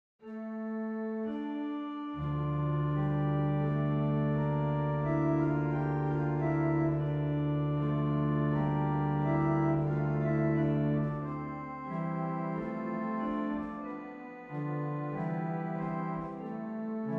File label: Preludio 432 notes; Luigi Picchi